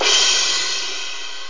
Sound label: cymbal; Groovy Bytes: Behind the Moon; BAS PERC